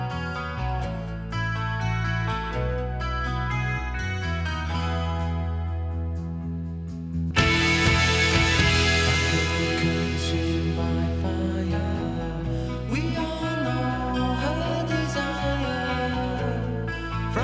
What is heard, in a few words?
rock